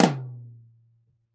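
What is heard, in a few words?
RAW floor tom, Drums Acoustic; AKAI; 90s sample CD; USB Soundscan vol.10; RAW KIT 6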